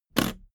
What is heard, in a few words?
suitcase latch lock jump open hard